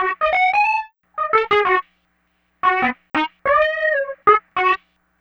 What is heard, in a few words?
Breezy Riff, Funk Keys; Sonic Foundry (Sony Creative Software); Synchro Funk